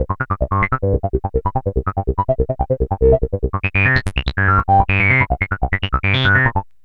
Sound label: Bass Loop